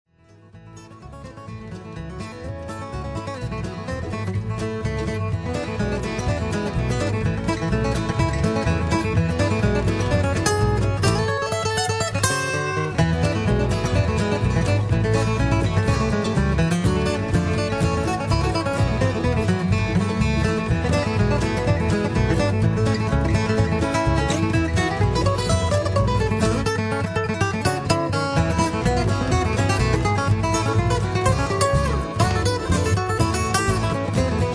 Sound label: Country Ride